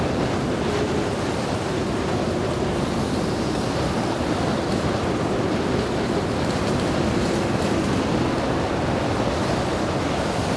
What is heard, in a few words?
Storm Loud; ambient